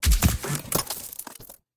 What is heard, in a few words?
Portal Stop; Game rip; MiSide